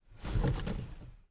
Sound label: foley hit; driver; Blind Drive